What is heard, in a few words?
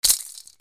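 percussion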